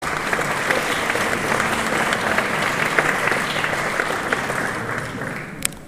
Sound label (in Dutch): applaus